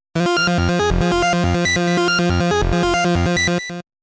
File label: arpeggio